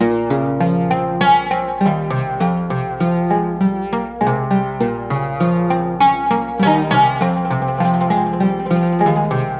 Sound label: Various Melody Loop - 100 BPM F Min; Cymatics; 2020 Melody Collection